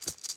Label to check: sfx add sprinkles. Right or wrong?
Right